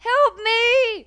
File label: Woman Idle; In the Line of Duty Firefighter